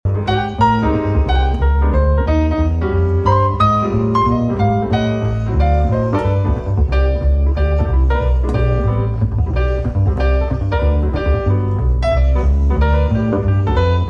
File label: blues